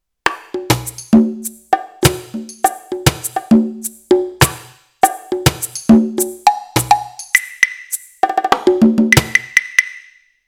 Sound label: Roland TD-4KP